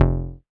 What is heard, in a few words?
bass F1, ARP Odyssey